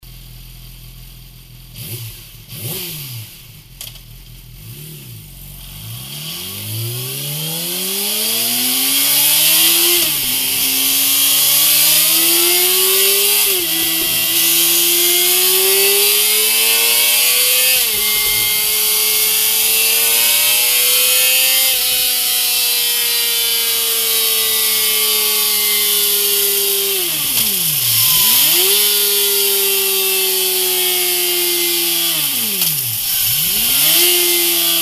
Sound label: YAMAHA YZF R6 GP